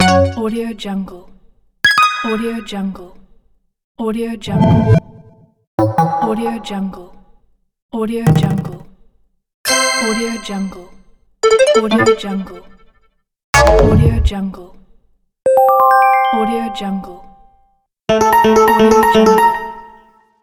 user interface menu sound

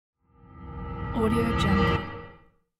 cinematic sound design

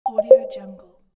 wrong

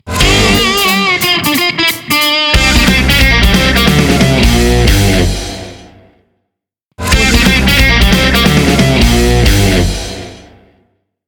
rock short intro

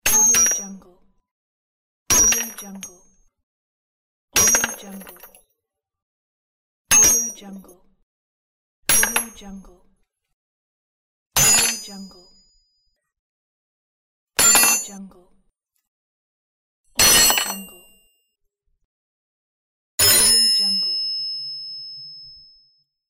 falling iron

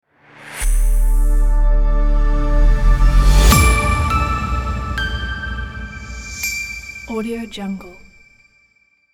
intro logo reveal

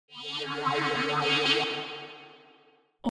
musical synthesizer scifi loading

